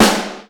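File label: snare, funk kits; Crypton - DrumBase5000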